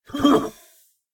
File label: Combat; Eshimal; SyrinScape; Snarl and Oraculum